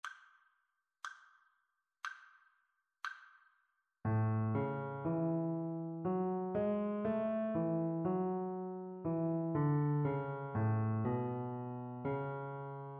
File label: rhythmic dictation; ear training